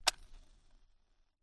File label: front, binaural recording; theverge